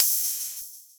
Hihat Open; Ableton Live 12 Lite; Core Library; Drums

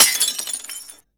Broken Glass; One Shots; FX; Ableton Live 12 Lite; Core Library

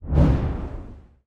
dash deep; action; Alabaster Dawn

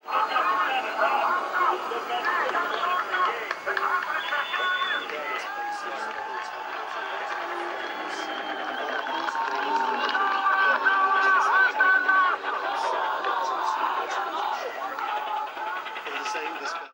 Television Crowd; Loops; Texture; Ableton Live 12 Lite; Core Library

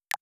Start Calibration; Standable Full Body Estimation

